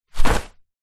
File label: wetsuit top drop ground; foley; zapsplat